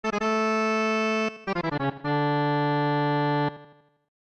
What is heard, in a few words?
Organ Song